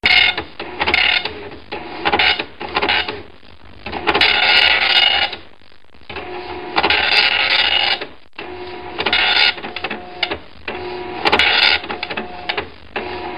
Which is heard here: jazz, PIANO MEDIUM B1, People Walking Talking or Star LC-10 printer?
Star LC-10 printer